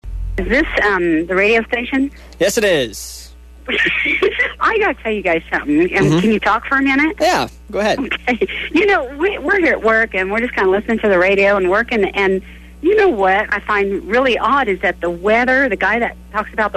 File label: women cackling